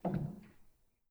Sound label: rel, Grand